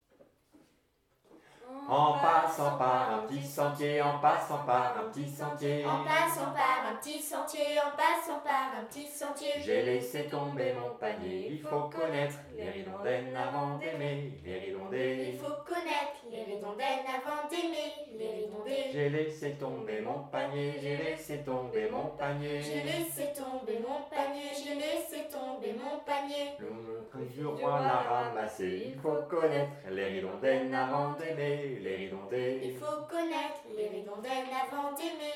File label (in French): contre rond chant, violon